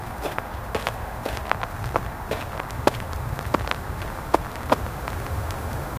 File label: ambient drone hum